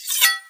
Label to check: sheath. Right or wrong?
Right